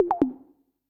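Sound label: PERCUSSION ELECTRO 140, Techno Drum; Techno2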